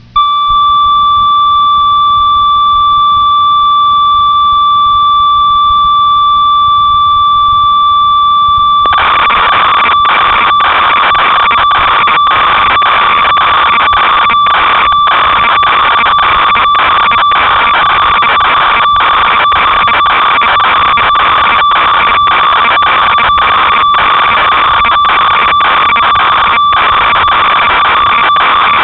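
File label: ob6 bass, synth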